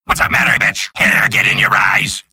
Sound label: kill; freak fortress 2; mad machine